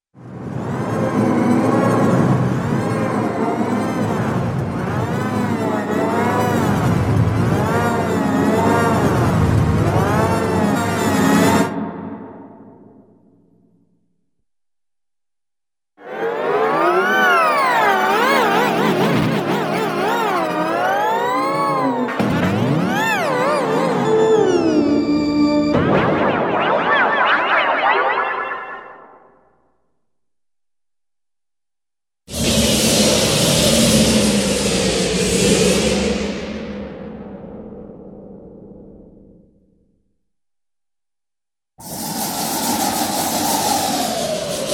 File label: Multi-pitched Crazy Dizzy Tones - Metallic Wind Gusts; Cartoon; Hollywood Edge; Cartoon Trax